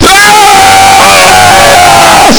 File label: sci scream